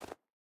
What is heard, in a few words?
footstep burlap snow jump start; Rust